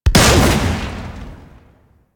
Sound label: Gunblade Shot